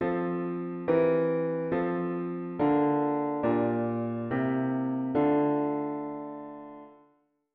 Harm Dict diat inv V7 inv bass

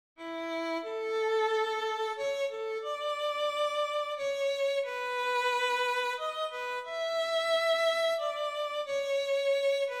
Mel dict chromatic long

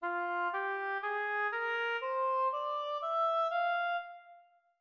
mel minor F4

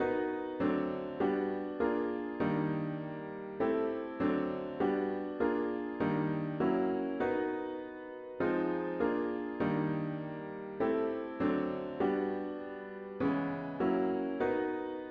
chords only

